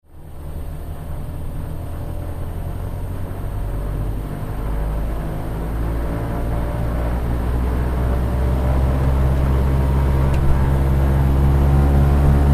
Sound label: aircraft 1941 lockheed hudson takeoff interior stereo